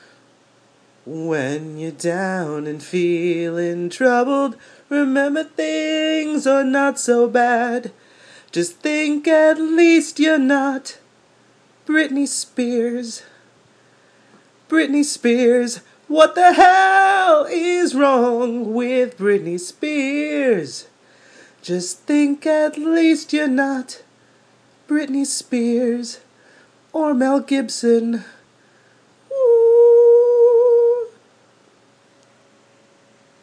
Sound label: an uplifting song